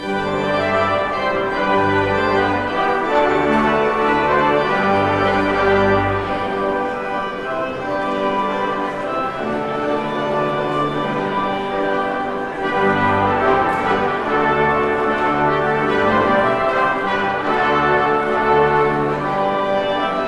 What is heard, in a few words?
Postlude Gavotte; from Terpsichore; Praetorius